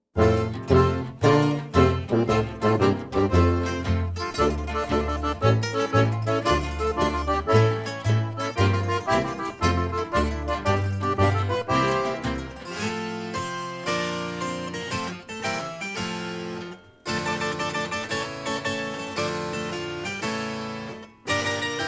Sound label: With Tuba, Harpsichord And Vocal; Pirates Of The Caribbean